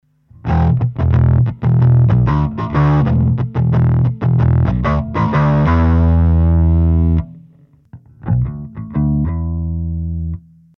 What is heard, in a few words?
distortion